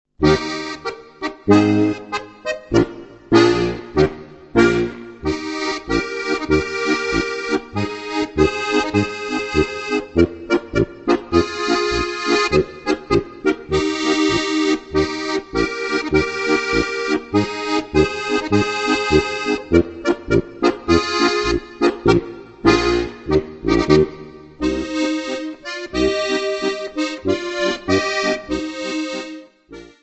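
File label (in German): Prangerschützen Marsch; Leicht & Guat - 15 leichte Märsche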